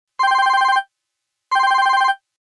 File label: Htc Ringer, Ringtone